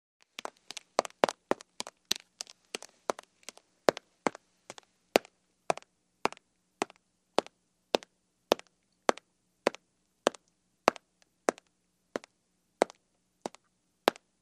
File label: Drips Heavy Splatty